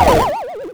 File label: Game Over